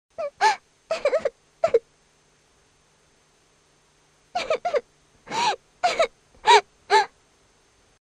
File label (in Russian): plachet malenkaia devochka